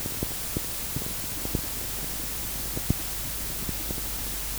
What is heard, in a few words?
Tape Noise